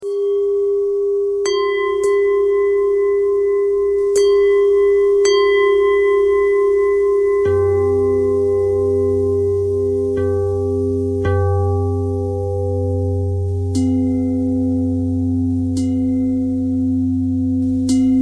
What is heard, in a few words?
bell thread bend thread